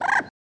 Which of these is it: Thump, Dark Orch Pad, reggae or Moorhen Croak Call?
Moorhen Croak Call